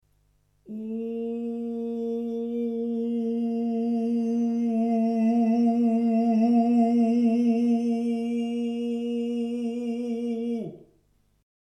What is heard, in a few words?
Bright Chiaroscuro